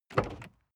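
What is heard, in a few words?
sports car exterior door open soft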